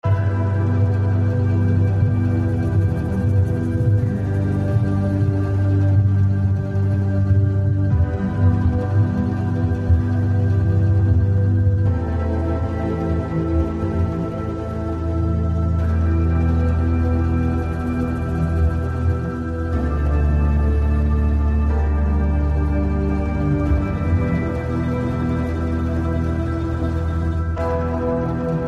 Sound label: Loop Suspense; tales from the borderlands gamerip 2015